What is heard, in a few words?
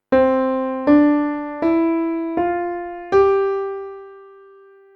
The first five steps of a major diatonic scale; Hearing Harmonies